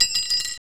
METAL, PRC METAL & WOOD

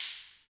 Ambient Stix, Afro Wood

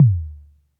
Toms, Elektron MachineDrum

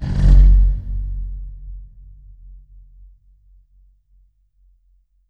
pipe rub; Q Up Arts; Denny Jaeger Private Collection

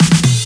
Fills 128BPM, Clubsounds

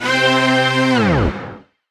Scratching FX; Vengeance - Essential Clubsounds Vol.4; Effects

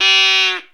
CROW; Zero-G Ambient 1; WHISTLE FX

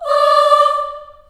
D4 CHS AH-L, CHILD AHS, Choir; Acoustic & Synth; AKAI; USB Soundscan vol.28

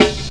Snare, Percussion Hits, Snares; Zero-G Jungle Warfare 1, 2 & 3; 90 s Sample CDs

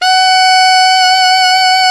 SAX ALTO FF, Tube

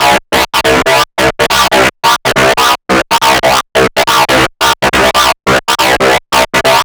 Arp 140bpm C, Trance

